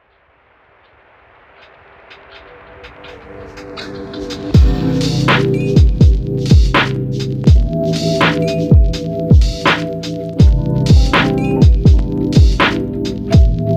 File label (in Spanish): analog A3 muestra